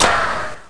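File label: ricochet; duke nukem total meltdown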